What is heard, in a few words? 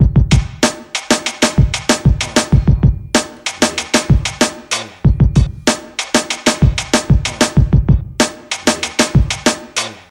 95 bpm rap drum groove A key; drum loop